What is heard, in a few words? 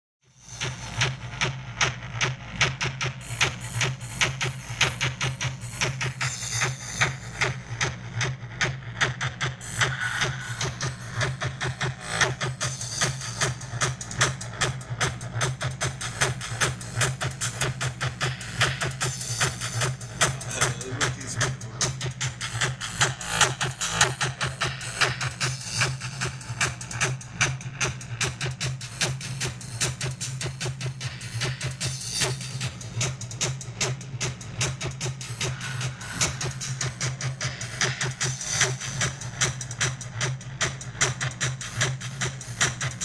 War Drums